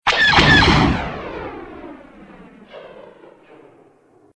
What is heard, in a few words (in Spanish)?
blaster pesado